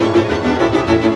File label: VIOLA GROOVE; Best Service; World Colours